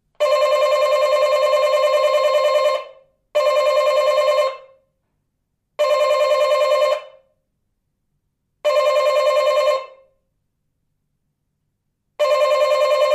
Door Bell Elect Doorbell

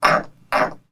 Frog California Tree

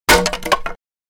Wood Single Piece Fal, falls

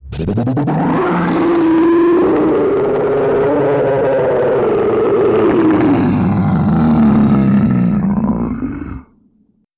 Gross Ugly Monster

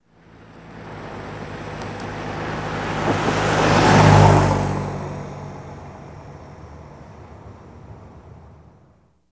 Car Compact Med By

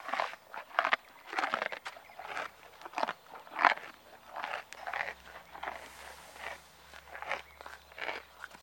Camel Eats Carrot Mun; camels

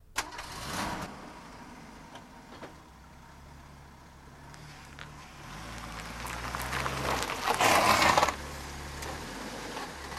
Car Start Medium

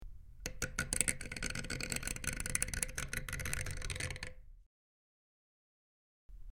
Metal Dial Rusty Metal, switch clicks

